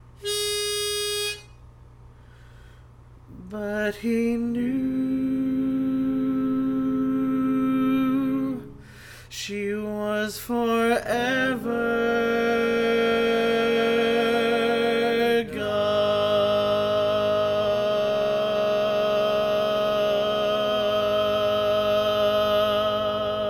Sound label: Lead, barbershop tag